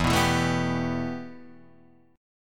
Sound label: B 7th Suspended 4th Sharp 5th E